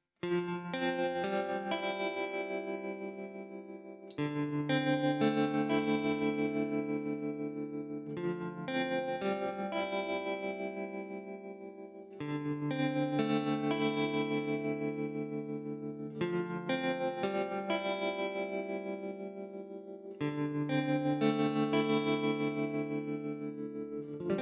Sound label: Tremolo, modulation; modulation pedals